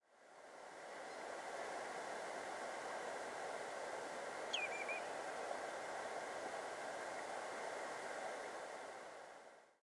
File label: Common Redshank Tringa totanus, Ladakh; Ladakhi name:Tsarik tingril